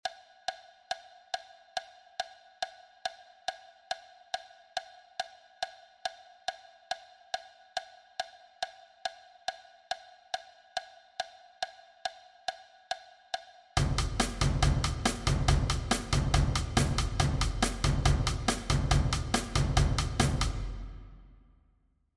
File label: perc